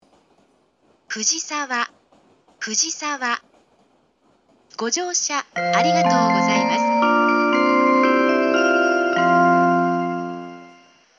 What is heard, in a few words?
arrival and melody; fujisawa